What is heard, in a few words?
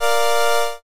brass